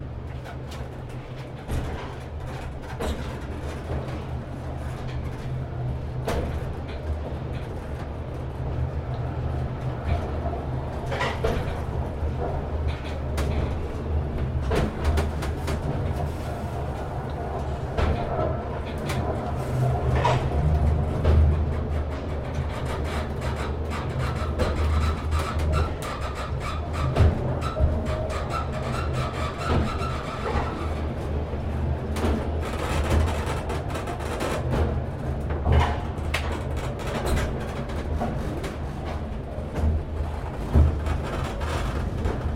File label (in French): ascenseur pour la tour eiffel